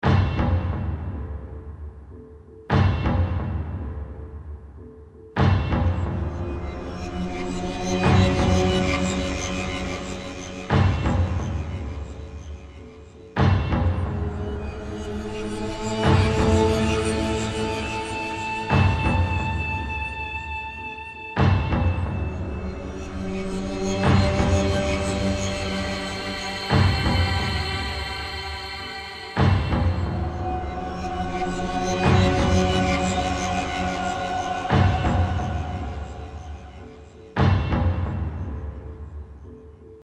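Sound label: Badge Turret Bloodbath Loop; F.E.A.R.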